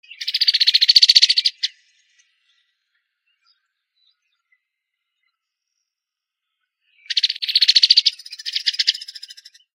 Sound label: Cowbird Brown-headed